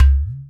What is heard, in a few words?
EQ Perc, Percussion Mix